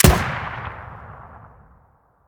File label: m203 shoot stereo; STALKER; IWP